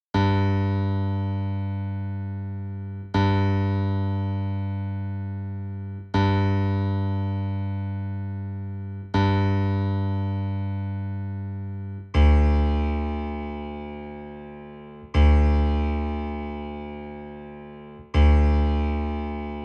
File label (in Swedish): Stämtoner bas